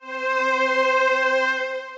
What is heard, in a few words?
Dark Strings, String Machines, Roland VP-330; Ableton; Factory Packs; Retro Synths